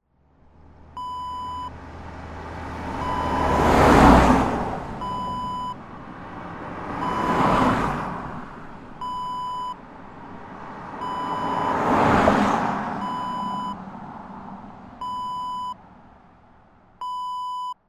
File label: cars pass by